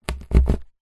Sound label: cardboard pierce pen